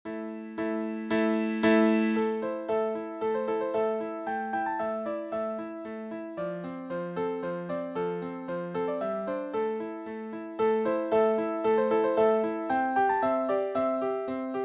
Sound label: Singing Reed